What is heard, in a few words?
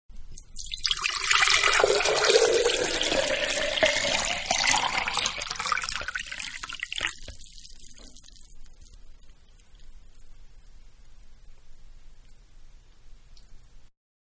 Pour Into Medium Pitcher, Water; Pouring; Objects; Manhattan Production Music